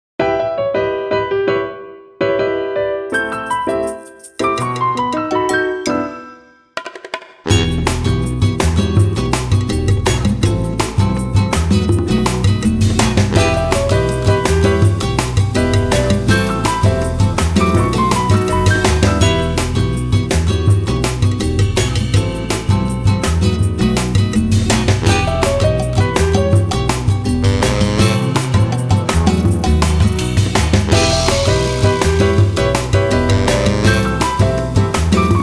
Club Piano

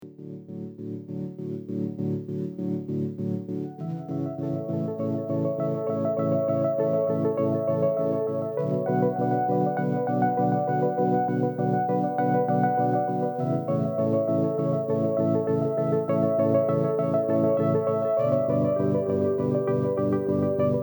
velvet melodie

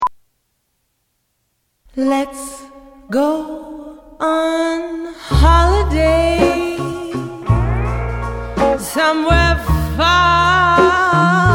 vocal

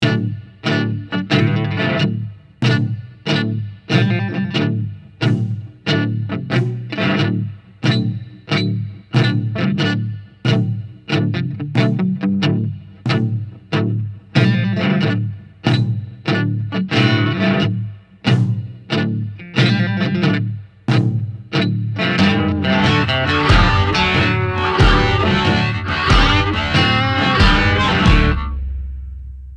Dirty little Blues

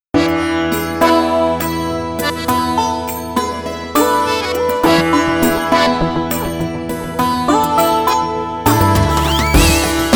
crazy sitar

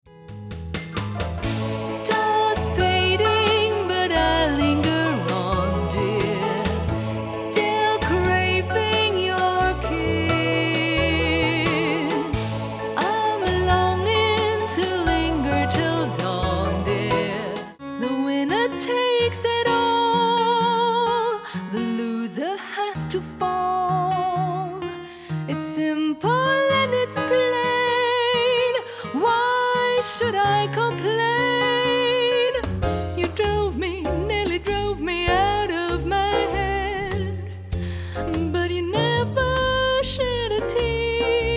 singing jingles

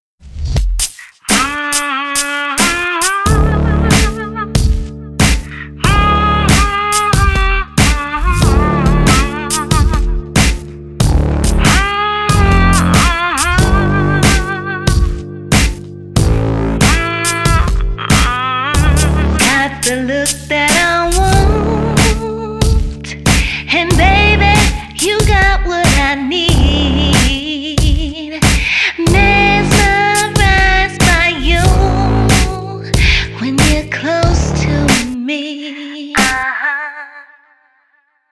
SONG

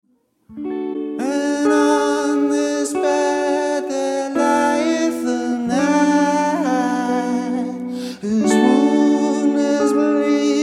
Corpus Christi Carol

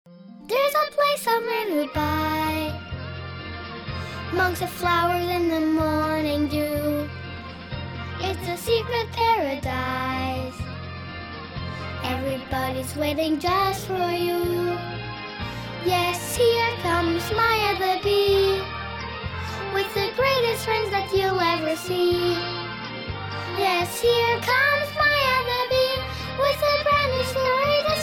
Vocals (Gesang); English US; LOFT VOICES

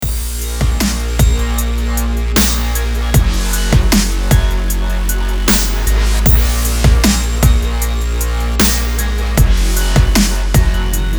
MYSTIC RIDE

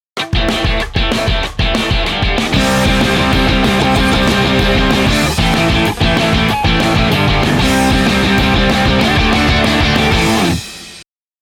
Rising Hell

